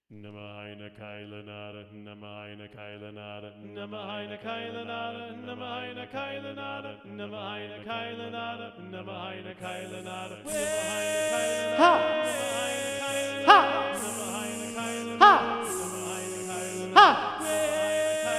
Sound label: SATB - Soprano 1 Predominant